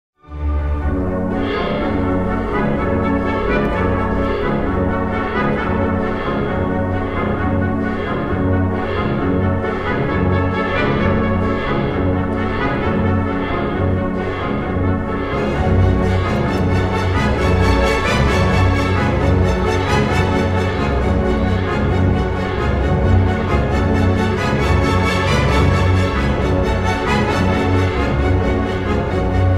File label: Reed Flutes Brass; LAA listeningroom